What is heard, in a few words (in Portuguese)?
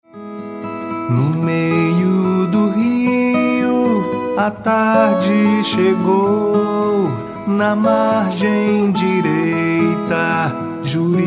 viola voz; viva; rio